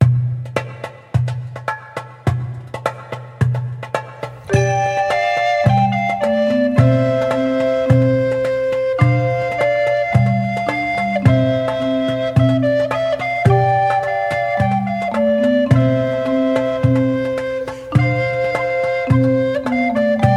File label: Alto Antics